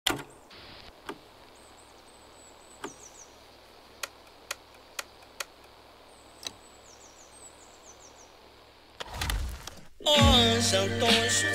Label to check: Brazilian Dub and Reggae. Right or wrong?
Right